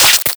spark tiny

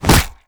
headbutt, zombie impacts; creatures

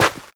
scuff, footsteps, player, carpet

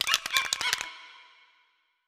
shake, halloween

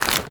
zombie move short, foley; Half Life Model Pack

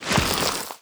close, zombie blind, foley; creatures

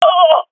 death, swat fem; vo; agents; Counter Strike Model Pack; CS2

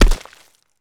land mud, footsteps; player

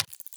step, headcrab black movement

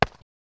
taunt clap; player

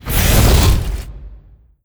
move approach walk, dog; npc; scripted

